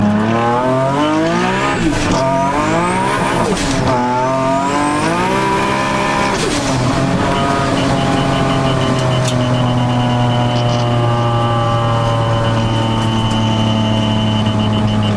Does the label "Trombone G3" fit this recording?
No